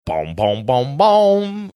singing first few notes

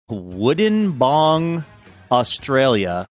wood bong; australia

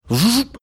sound of electricity zapping through body

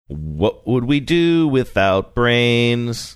singing what would we do without brains

